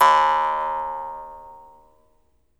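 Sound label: Jaw Harps, Pitched Percussion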